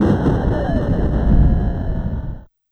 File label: Explosion, Yamaha PSR-E323 SFX Kit